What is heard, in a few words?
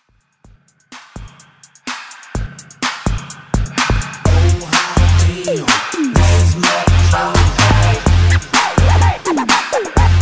Throwback Party Break